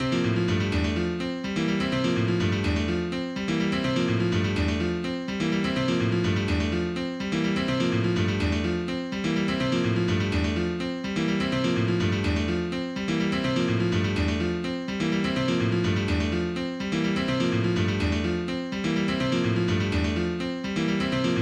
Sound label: piano